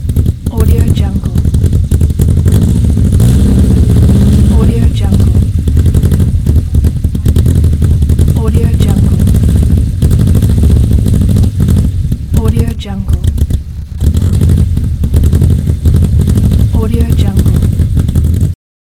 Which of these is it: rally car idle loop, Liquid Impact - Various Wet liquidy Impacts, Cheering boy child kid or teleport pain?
rally car idle loop